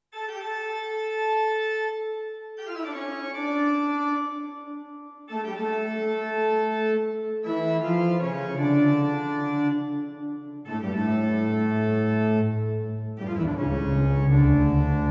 Sony DRE 2000 Organ

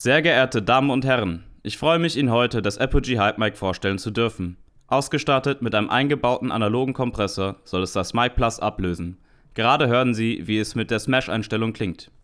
Apogee Hype MiC Smash

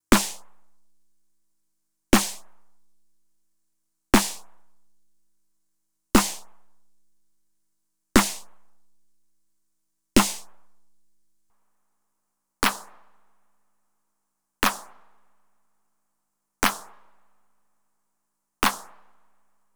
RD-9 vs TR-909 SN CL Phase

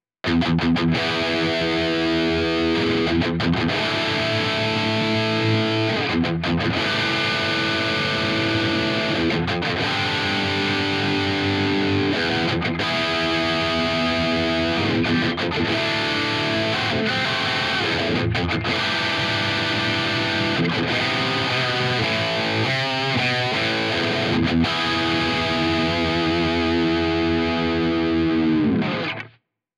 Tight Simple Gain; Two Notes Audio Genome

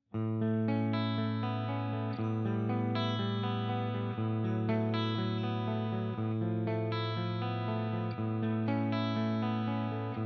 HB Fusion-T clean both

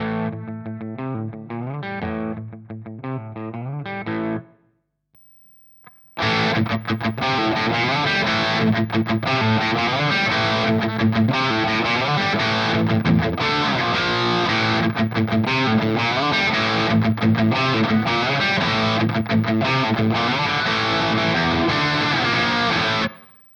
Vox Valvenergy Cutting Edge FX Loop

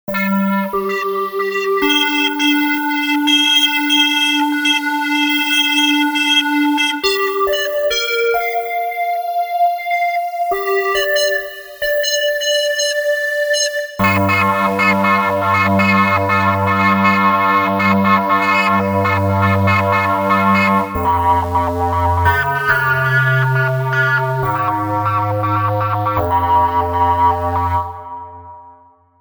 Polymorph Villain Stack

